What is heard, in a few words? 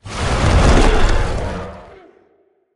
marshmallow fall; frozen rush